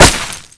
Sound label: Bullet Impact